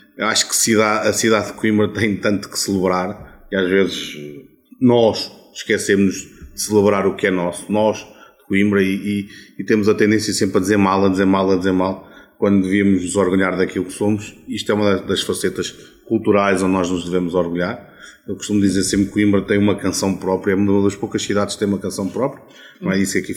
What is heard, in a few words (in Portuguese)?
CORO